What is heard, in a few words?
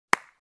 High five